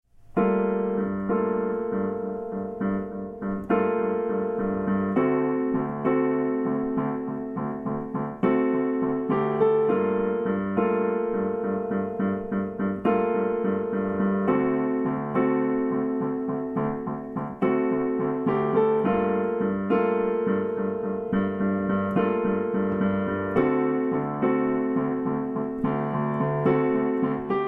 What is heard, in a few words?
piano